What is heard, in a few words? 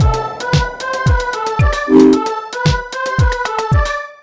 loop; lsd revamped